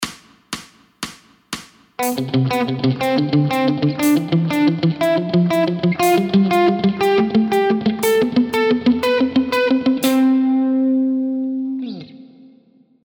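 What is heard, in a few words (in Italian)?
Esercizio Intervalli di Settima, chitarra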